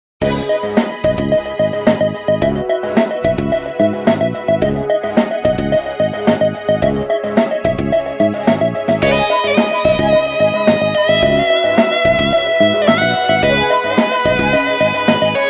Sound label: midi ring